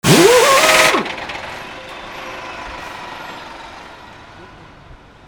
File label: VEHICLES